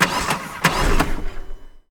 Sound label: engine fail; crocs